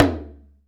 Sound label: SURDO, PERCUSSION; AKAI S6000 CD-ROM - Volume 5; GM PERCUSSION KIT 2